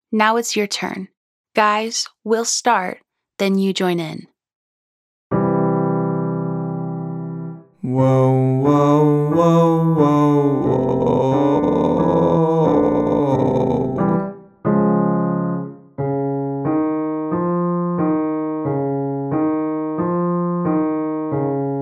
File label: Clear to Edgy Woh 3 Note Scale Male